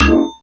OUCH HIT, METAL PERC; Zero-G Ambient 1

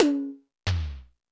Toms 1, drum machine

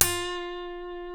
G, funk guitars; Spectrasonics; Hans Zimmer Guitars VOL-1; TAKA FUNK

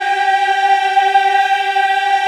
G4, Choir Acoustic & Synth; USB Soundscan vol.28; AKAI

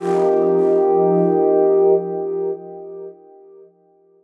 Pad Soul Chords, Drum N Bass; Sonic Foundry (Sony Creative Software); Knutrix Drum & Bass

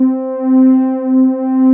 JP ANA PADS; Pro-Rec - Synthsonic; JV ANALOG1

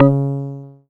C#, Synclav FM PLUCKED; Big Fish Audio

